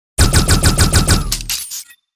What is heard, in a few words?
Hand cannon rapid fire mode